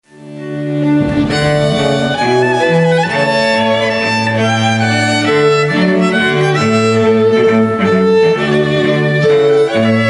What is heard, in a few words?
trumpet voluntary